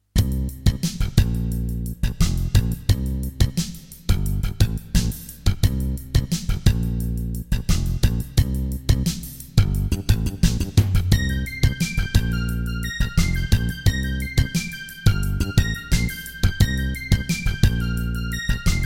Terratec MiniWaveSystem WT64

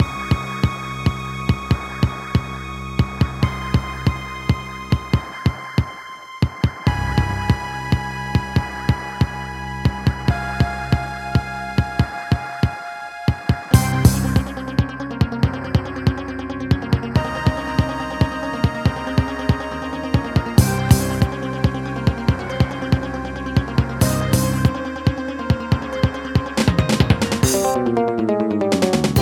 Alesis Harmony 32; sigil doom episode 5

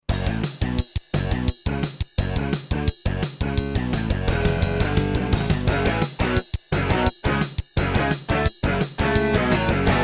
V7 media fx; Duke Nukem 3D

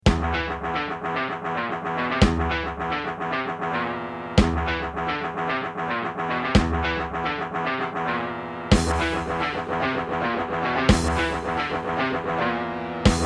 Diamond Monster Sound 2MB; Doom

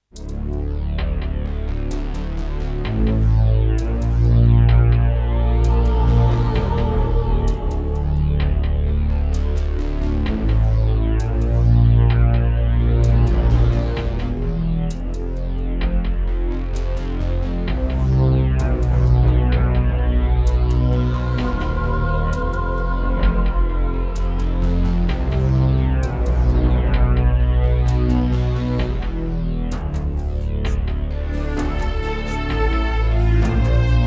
Roland CM-300; Descent II

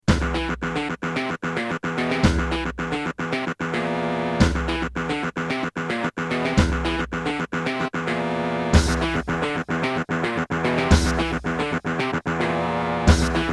Pine PT-202 Vivaldi Wavetable DB; Doom

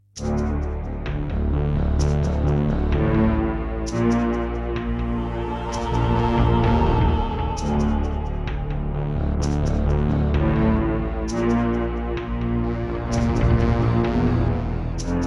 Creative Wave Blaster; Descent II

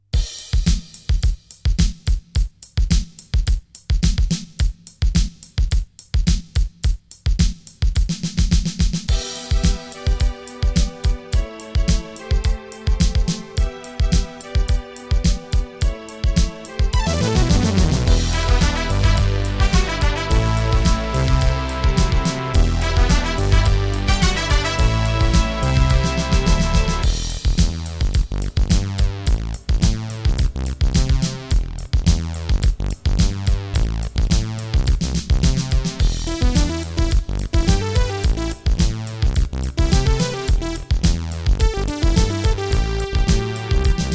Terratec MiniWaveSystem WT64

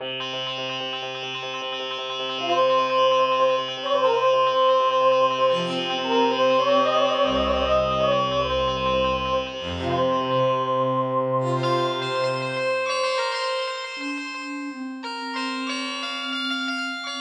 Intro, LG MIDI ART GS1000R; Prince of Persia 2 - Intro

